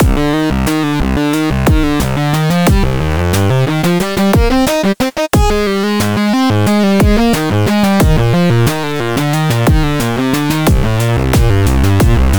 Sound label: trumpety